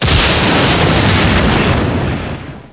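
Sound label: lightning; Hexen II; crusader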